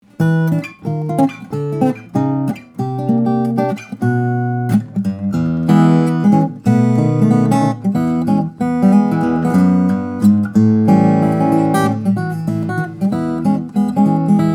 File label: x7 opus series, steel string guitar; emerald guitars; red; flattop